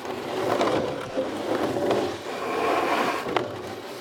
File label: character Move; jumanji